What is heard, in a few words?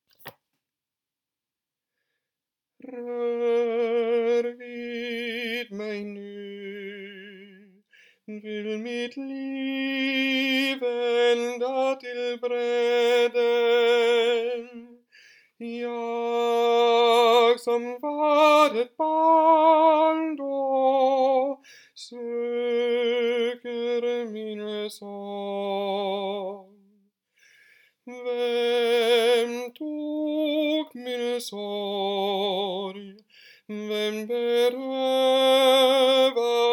Kärlekens tid - tenor